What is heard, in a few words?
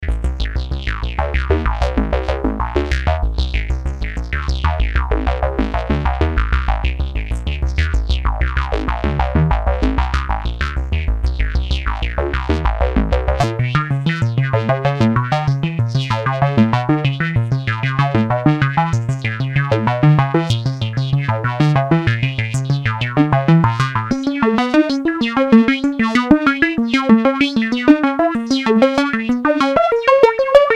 arp, modular; modular planet